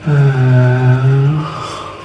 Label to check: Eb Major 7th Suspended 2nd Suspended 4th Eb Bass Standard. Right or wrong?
Wrong